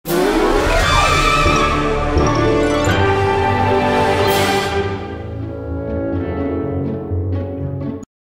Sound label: Level Complete Stinger; Shrek the Third; PS2